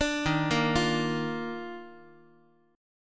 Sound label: D sharp Major 7th Suspended 2nd, Guitar; arpeggiated; JGuitar